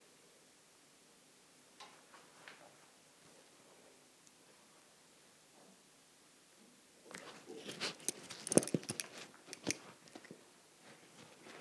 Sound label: Leather Suitcase Foley